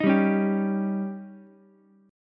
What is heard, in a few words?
C sharp Suspended 2nd Flat 5th C sharp Ukulele; strummed; JGuitar